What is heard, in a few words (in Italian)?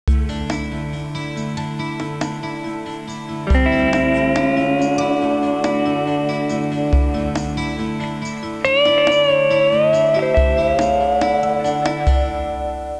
suonerie musica hawaiian